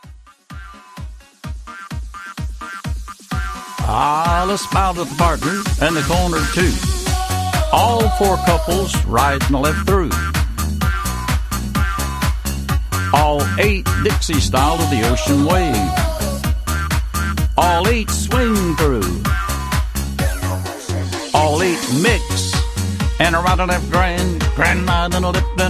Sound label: Patter